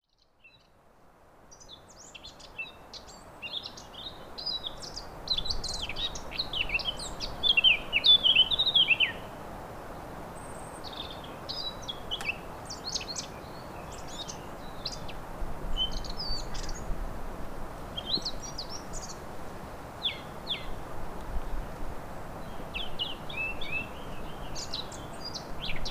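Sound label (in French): Fauvette a tête noire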